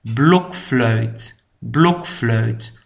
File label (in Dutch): blokfluit